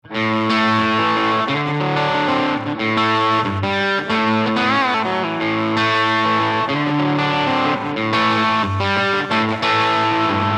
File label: tele champ travis pick; mercury